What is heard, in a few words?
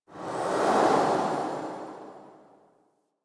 Museum Item Look Whoosh; Warframe; Vitruvian Museum